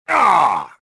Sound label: pain; Star Wars Jedi Knight II Jedi Outcast; XBOX; bespincop